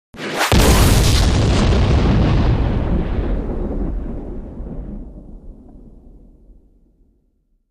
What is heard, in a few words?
Bomb Explo Med